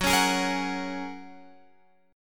F sharp Major Add 9th, Guitar, Open F; F#add9 strummed; x,13,13,15,13,13; chord shape

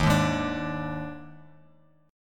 Eb Major 7th Suspended 4th Eb, Bass Standard

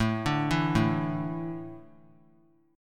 A Suspended 4th; Asus4 arpeggiated; JGuitar

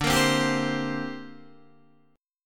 D 7th Suspended 4th Sharp 5th down Guitar Open D

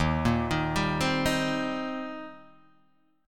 D sharp 6th, D sharp, down, Guitar, Open D

